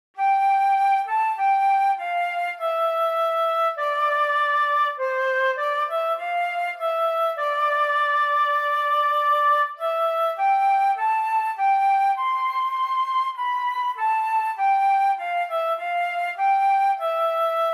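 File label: solo flute; Alle Jahre wieder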